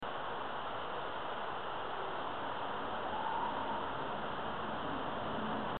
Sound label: scream; RCA; evp